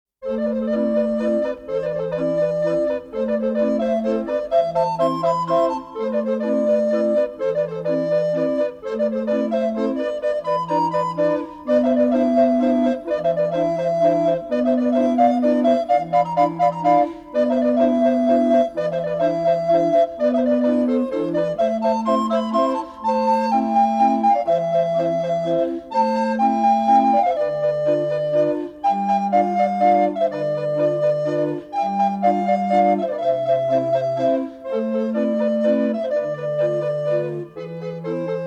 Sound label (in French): Musique de la pluie